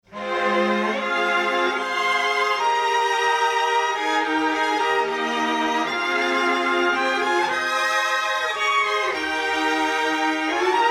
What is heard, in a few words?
Strings Bus Hall On